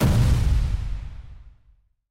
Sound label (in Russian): silnij udar po metallicheskoj plastine molotkom